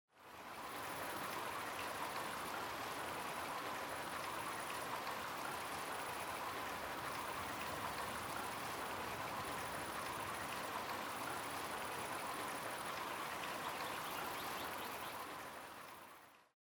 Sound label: Creek; Age of Empires III